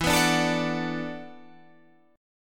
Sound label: E 7th Suspended 2nd Suspended 4th, Guitar, Standard; E7sus2sus4 strummed; 12,14,12,14,15,14; JGuitar